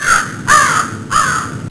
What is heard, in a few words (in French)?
corbeau